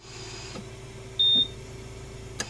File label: ventilator